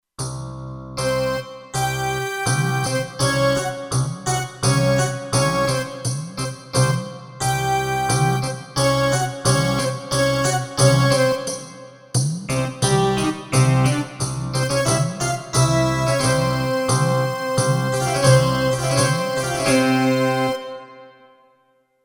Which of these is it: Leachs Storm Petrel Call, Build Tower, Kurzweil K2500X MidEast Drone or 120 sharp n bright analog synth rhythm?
Kurzweil K2500X MidEast Drone